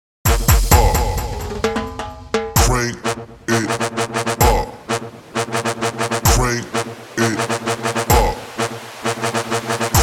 GUARACHA 130 BPM

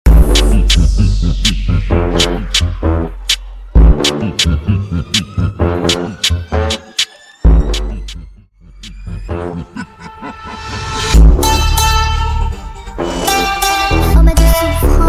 Funk Brasilero Intro Outro 130 BPM; Latin and electronic genres

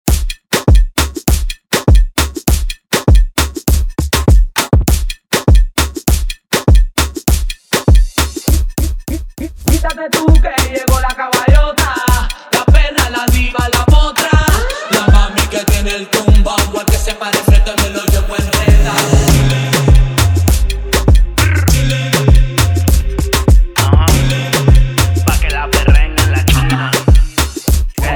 remix bpm 100

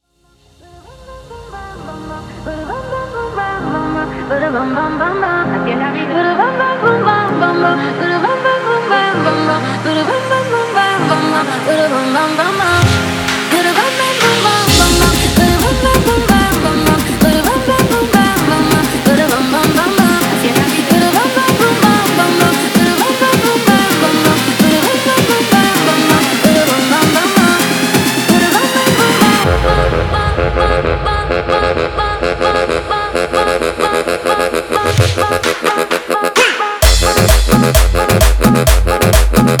tribal remix 130 bpm

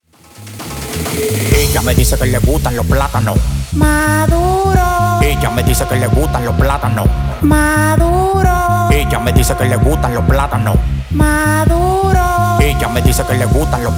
suave tribal remix 130 bpm

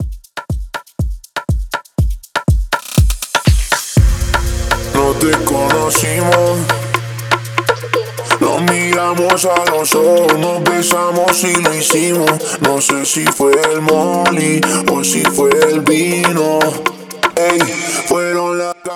BPM 121

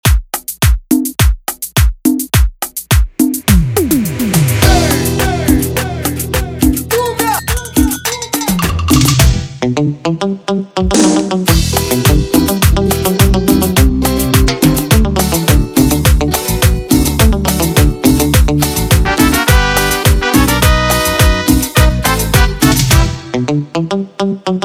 105 bpm

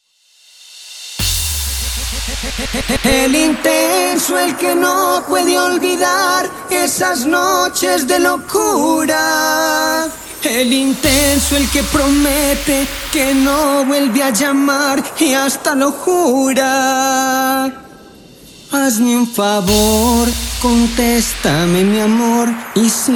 Starter Acapella 97 Bpm; DJ Javier Navarro is a versatile remixer blending every Latin genre and EDM Music; Prodeejay Remix

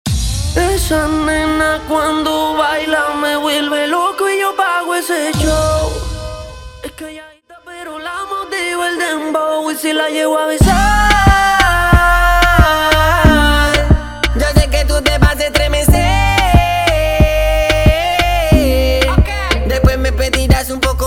Hola Remix Dancehall Acapella Starter 91BPM

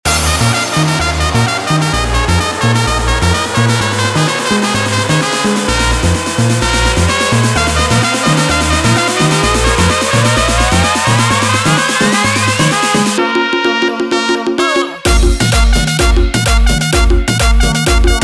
guaracha remix 128 bpm; Reggaeton dj remixes; Prodeejay Remix

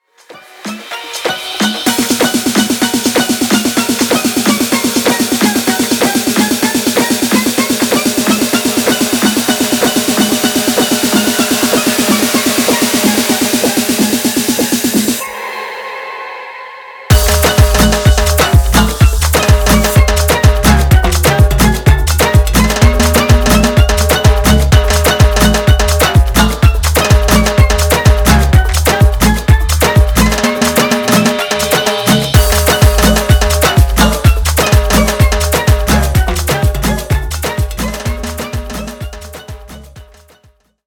afro remix 126 bpm

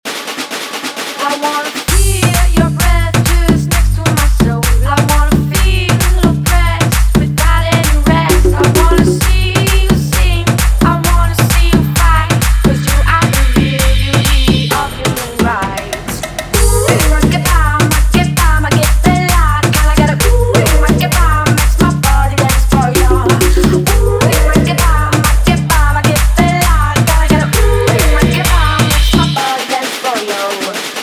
intro outro 131 bpm

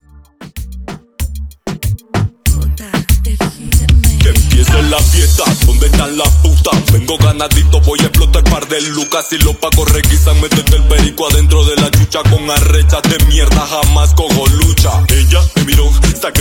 Lalocura Intro Outro 95 Bpm

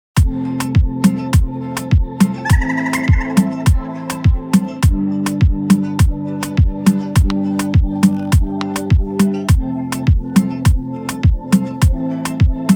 Intro Outro 105 BPM

